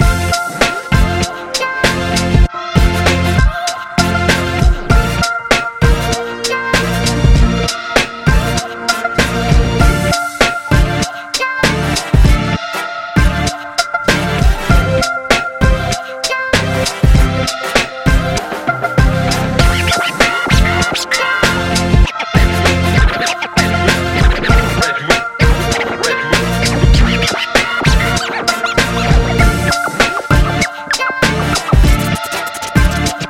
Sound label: Hip Hop Loop